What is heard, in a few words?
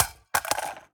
phys mag drop ar poly concrete, magazine drops